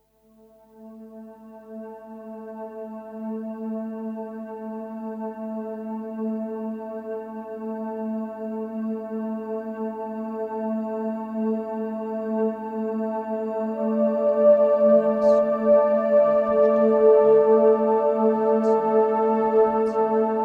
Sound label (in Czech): BRAINWAVE Vnitřní klid Spa